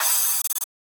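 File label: drum crash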